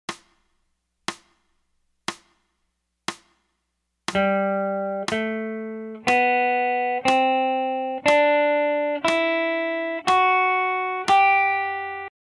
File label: g major up; scales